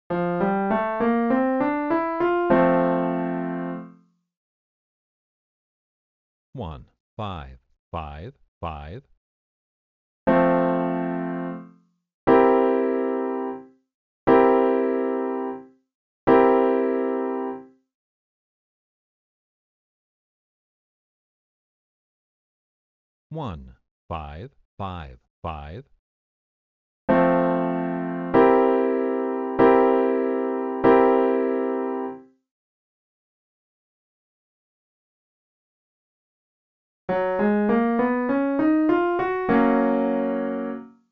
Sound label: I, IV, V, progressions; V7; easy